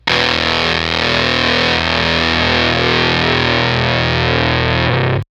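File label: Fuzztest guitar broadcast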